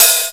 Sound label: natural open hi hat one shot b key, open hat sound tuned to the B note